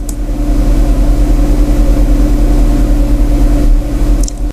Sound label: postprocess noise dirty